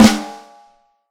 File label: FLAM, Snare Drum; 4X14; Q Up Arts; Johnny.C's Vintage Snare Drum